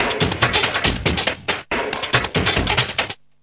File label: 140 syncopated weirdness, drum loops and breaks